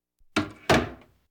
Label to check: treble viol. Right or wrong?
Wrong